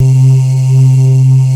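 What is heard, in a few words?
organ vox C3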